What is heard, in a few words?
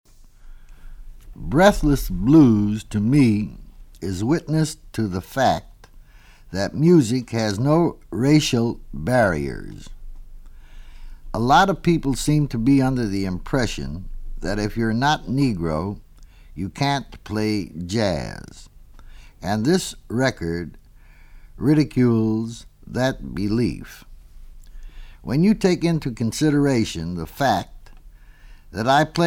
BREATHLESS BLUES QUINTET